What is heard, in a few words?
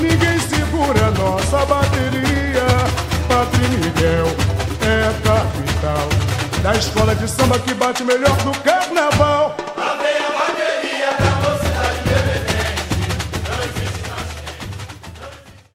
Break